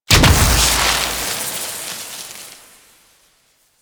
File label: Gardening Plant Explosion; Warframe; Duviri